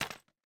Magnet Drop; MiSide

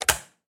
Electro Switch; Game rip; MiSide

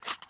Fork Take; Game rip; MiSide

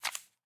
Change Card; Game rip; MiSide

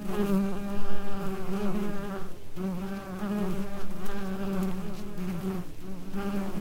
Flies; Game rip; MiSide

